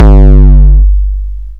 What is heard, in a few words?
BD, Dance Mega Drums, BD HOU; Best Service